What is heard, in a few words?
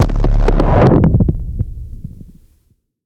Impact